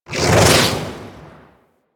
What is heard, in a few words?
Shockwave Spaceman Dash; Warframe; Venus; Venus Shockwave Spaceman Agent